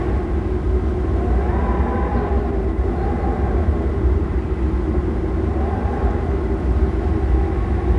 idle loop; Necrophos

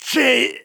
Death; Engineer; Paladins

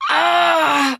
Death; paladins; Huntress

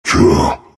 ursa pain

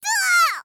Fairy Death Grunt

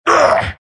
pain; troll warlord

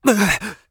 Assassin Grunt Fast; Realm Royale; Shinobi

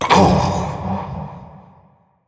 Grunt Hit; paladins; Grover

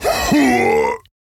Grunt Hit

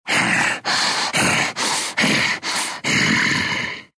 breathing, ru; Dota 2

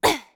Grunt Jump; Realm Royale; Mage; Starburst Sorceress

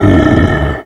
Neutral Rock Golem death

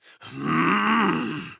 Grunt Slow; Realm Royale; Warrior; Lord Of Darkness

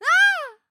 Falling; paladins; Assassin

